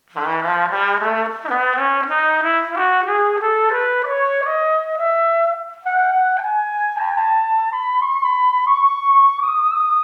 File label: 3 octave scale